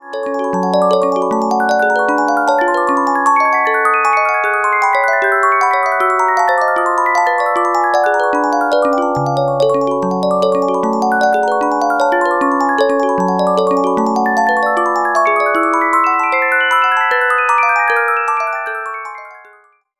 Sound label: Carillon Music Box Melodies